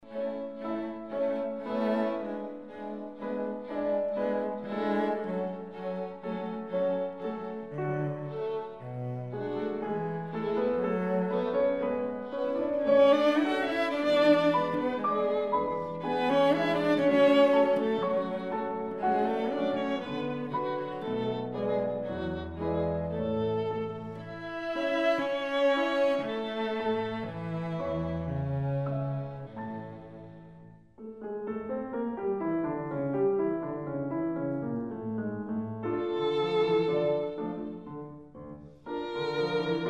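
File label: piano trio; breton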